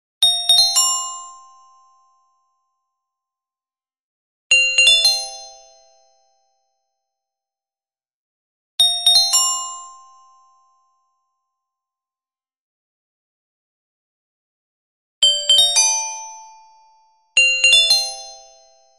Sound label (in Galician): Muineira CARILLON CONTRALTO; Muineira de Lugo